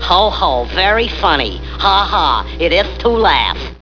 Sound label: to laugh